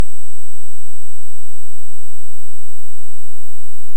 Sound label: Hydrophone; OneOcean